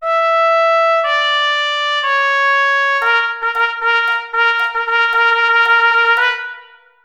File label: unison rhythm with click; mm, 197-200 (MIDI audio file)